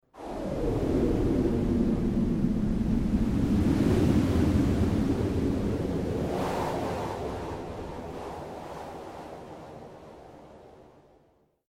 Evil Wind Gust Horror Vocal, Evil Wind Gust Vocal Scary Dark Ominous Demonic Whoosh; Ghosts